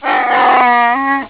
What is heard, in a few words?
creek goat